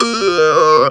OUUUOU, Funky Vocals; AKAI; USB Soundscan vol.18; TB MELODY

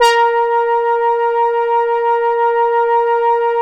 A#4 ANA PAD

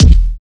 DRMS, Underground Hip Hop

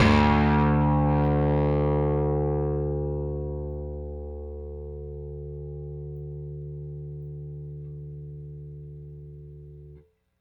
Rickenbacker Bass; Airwindows

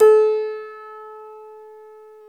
PNO, Tack Piano; Club-50 - Foundations Roland

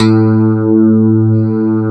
CLAV, FUNK FM CLAV

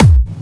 Clubby Kicks, Bassdrums

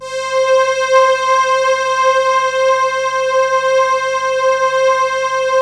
PAD7 C5; USB Soundscan vol.05 - Explosive Jungle; AKAI

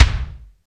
KIK, Natural Kick; Roland L-CD701; Natural K1

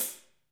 HH, KIT Real-Kit

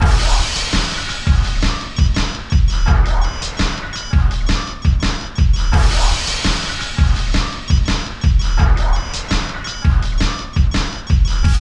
LOOP, Tekno Industrial; East West

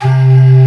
STEAM C2; E-MU Formula 4000 Series Vol. 3; Analog Odyssey

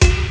bdrum; Amiga Soundtracker Sample Packs

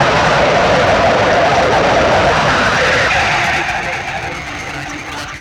Kaos FX, Ambient Grooves; Sonic Foundry (Sony Creative Software)

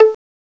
PIZZ HI, HISTRING; CMI Sound Library Rev 1.3

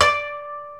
KOTO D4

